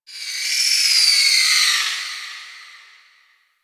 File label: Sentient Lair Exterior Creature; Warframe; Ambience